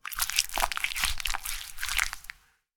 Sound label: Wet Paint Over Transition